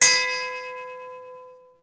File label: notebook; Pirates Of The Caribbean